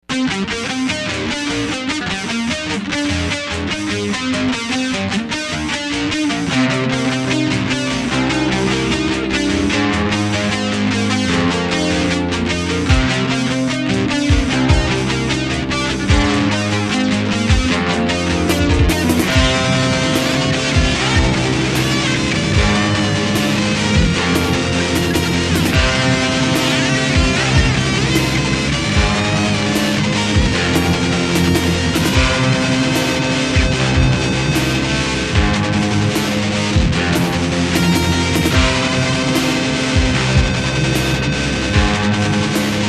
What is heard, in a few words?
guitar, music; Jazz Jackrabbit